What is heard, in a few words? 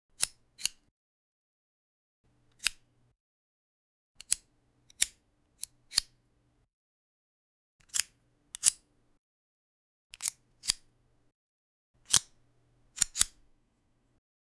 holographic knife opens a, futuristic